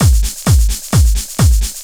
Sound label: straight, Techno Trance Essentials drum loops